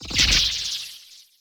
Teleporter Nebula Cloud Enter; Evolve; Wraith

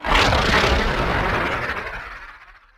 Nebula Cloud Prepare Start; Evolve; Wraith

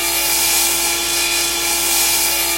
Saw; Terraria; Prime

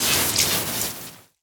Melee Impact Electric; Grounder; Evolve; Kraken

